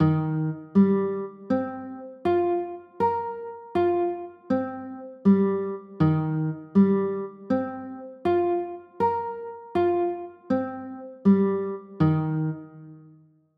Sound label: d sharp 6 9, chords